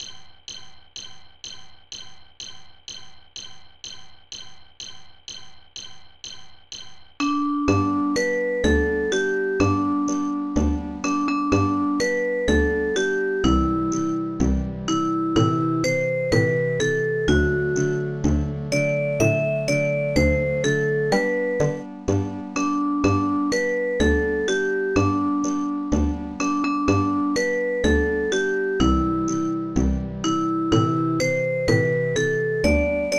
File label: jingle